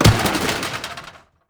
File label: metal container break; physics